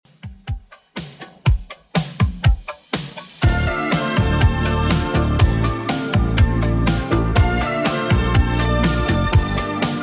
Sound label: 80s Redrum 122bpm